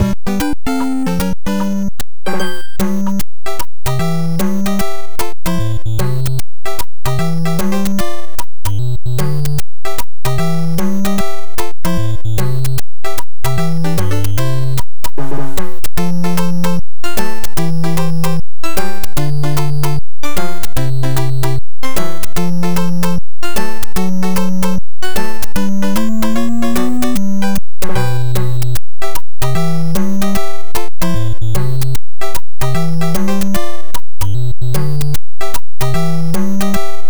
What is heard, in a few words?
nes overworld background; super mario bros 3